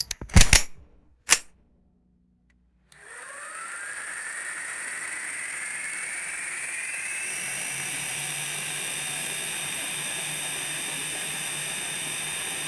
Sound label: mechanical snap and fluttering motorized whir; air